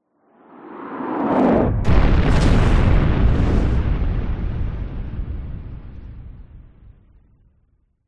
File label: warfare missile incoming whizz by then explosion